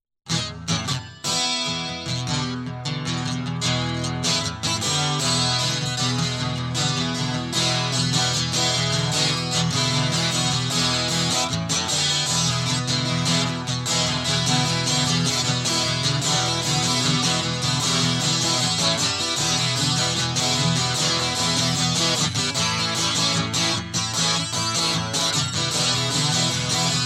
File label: Guitar